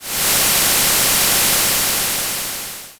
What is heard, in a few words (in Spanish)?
z ruido